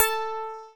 SYN, SYNTHESIZER; DIGI WAVE #1

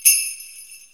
BELLS, COOL PERCS; Crypton - DrumBase5000

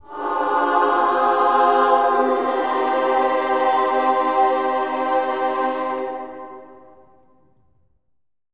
amen; Spectrasonics; Symphony of Voices; BC AMENS

